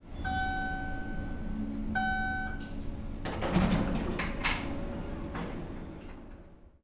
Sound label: Elevator Door Open